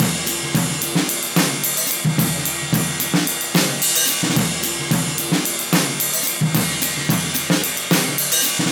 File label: 110 jazz swing, live breaks